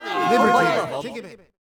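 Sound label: murmur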